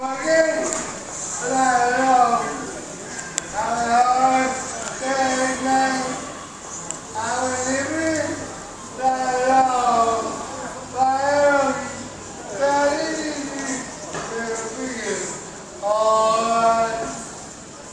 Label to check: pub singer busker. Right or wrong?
Right